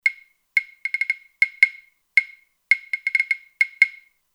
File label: Click, Kurzweil K2500X; Factory Programs